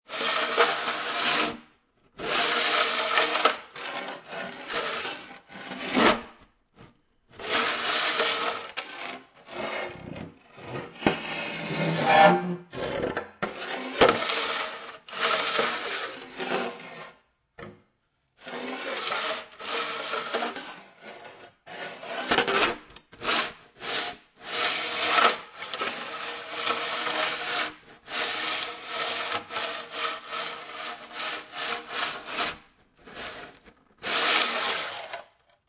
Shovel Scrapping On Concrete, Foley, Shovel, Large Plastic Shovel, Scrapping On Concrete, Many Scrapes